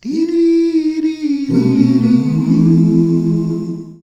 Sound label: desktop logout